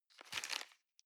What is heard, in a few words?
plastic bag small grab soft